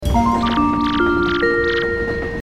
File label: train announcement; Paper Mario 2 The Thousand Year Door